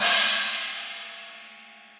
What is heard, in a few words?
China Big Punchy, Cymbal; Drums; Drum Hits; Standard Hits; Ableton; Factory Packs; Session Drums Club